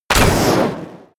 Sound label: bow shot fire